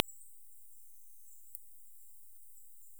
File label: Myotis macropus